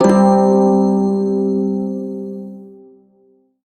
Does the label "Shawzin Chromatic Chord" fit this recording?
Yes